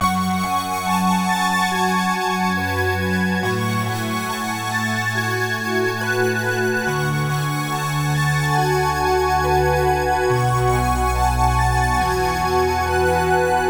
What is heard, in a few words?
Lead